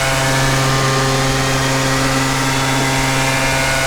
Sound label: KART EX OFF MID